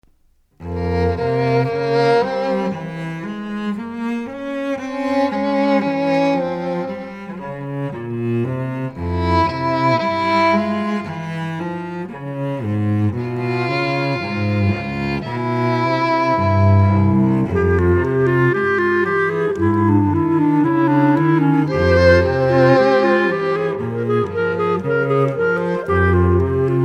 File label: Boat Coda